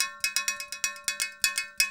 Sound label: PERCUSSN ETHNO 125, Drum